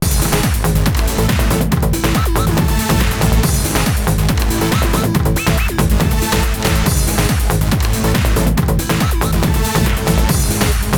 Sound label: STAGE bgm; Street Fighter IV